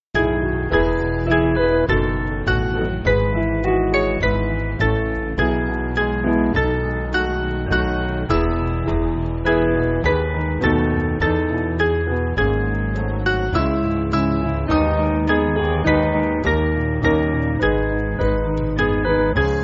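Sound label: Piano, Backing Files for Churches; Mainly Piano (CM)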